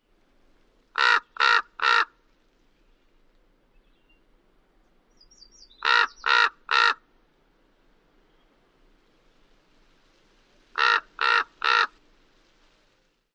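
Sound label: calls, Chihuahuan Raven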